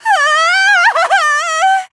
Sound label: scared; toko